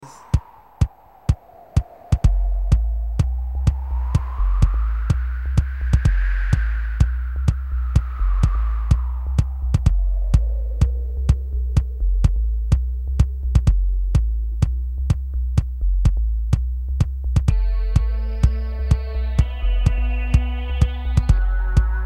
Wind

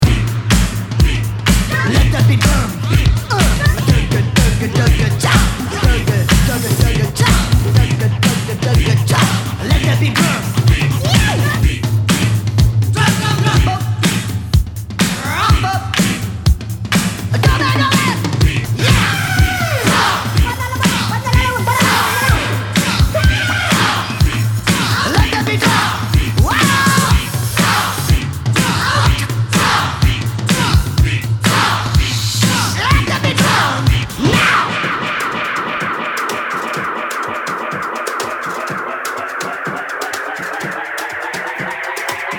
Drums

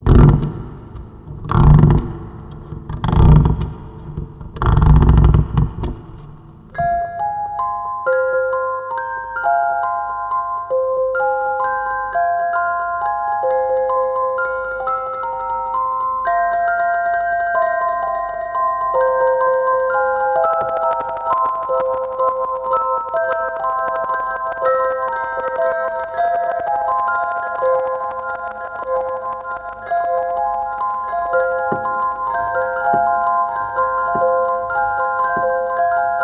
A little mechanical waltz

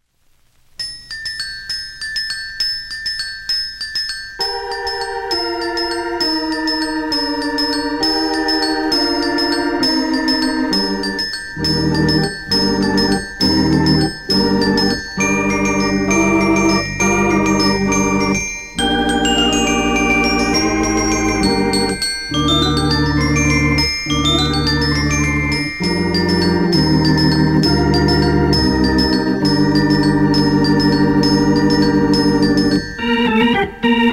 Organs & Chimes - Carol Of The Bells; Hanna-Barbera

